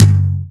tom, drums; virtual drum sounds; custom drums

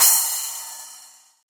splash, virtual drum sounds

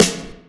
snare, drums; virtual drum sounds; custom drums

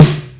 snare; drums; virtual drum sounds

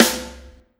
snare, drums; virtual drum sounds; custom drums

